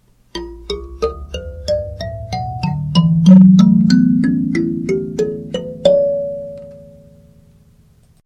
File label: kin A, Kalimba; silvermooncreations